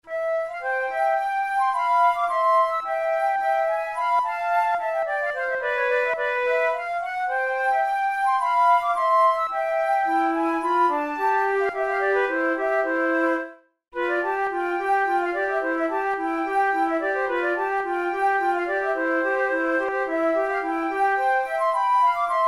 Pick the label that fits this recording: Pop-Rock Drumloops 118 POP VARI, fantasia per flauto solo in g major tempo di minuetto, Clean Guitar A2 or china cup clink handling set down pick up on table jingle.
fantasia per flauto solo in g major tempo di minuetto